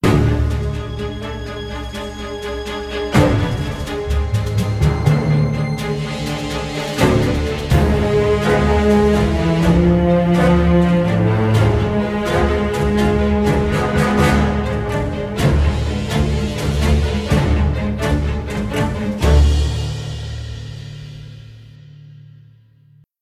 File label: Rocket Launch Starts